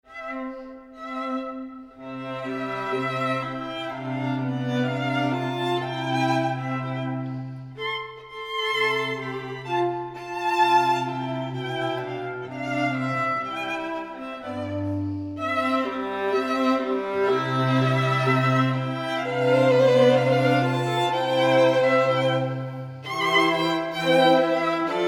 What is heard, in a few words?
string quartet